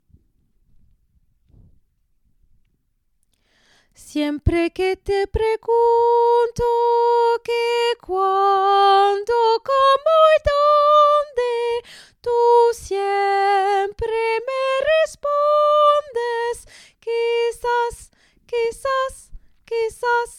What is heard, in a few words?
tenor